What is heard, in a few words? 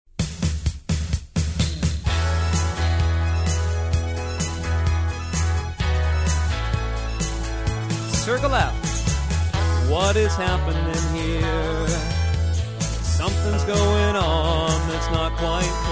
vocal